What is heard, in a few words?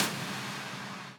impact